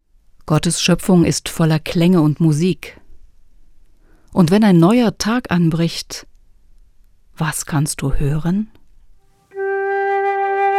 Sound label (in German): Musik zum Sonnenaufgang